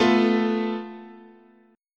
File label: A Suspended 2nd Sharp 5th, chord, guitar